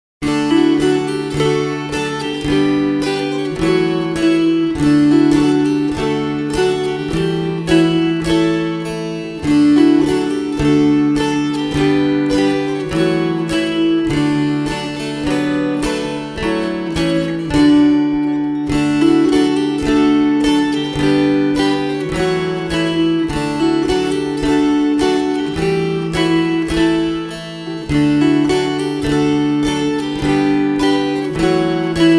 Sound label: Forked Deer slow